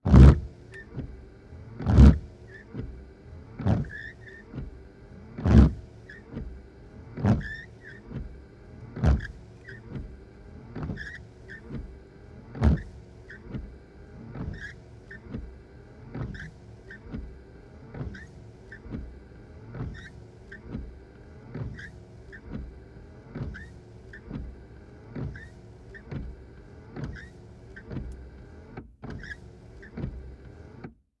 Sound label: Auto Wind Shield Wipers Low; Transportation